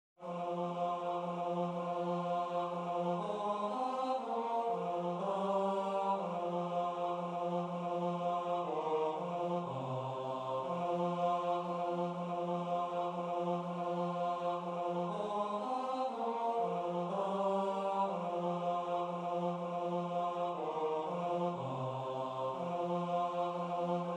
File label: bass; Quand je bois